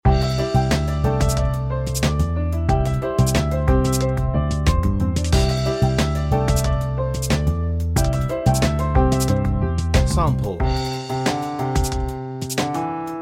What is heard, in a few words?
happy piano drum